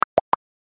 notify

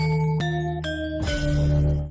GAMELAN

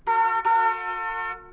car horn

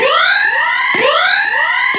alert

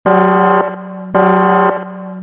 alarm

